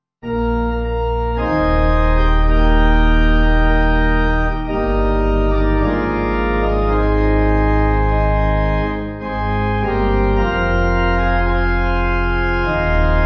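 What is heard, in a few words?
Pipe Organ, Music Backing Files for Churches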